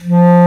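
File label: Bass Clarinet p F2; Multisamples; Digital Sound Factory Virtuoso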